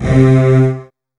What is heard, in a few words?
Virtuoso Strings